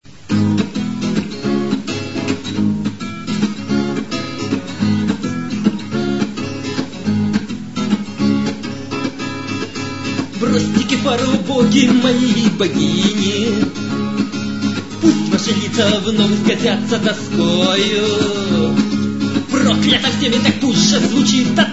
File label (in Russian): frigijskaja flejta